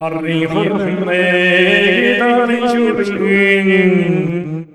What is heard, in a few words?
MONGOL V FX, Asian Traditions; USB Soundscan vol.60; AKAI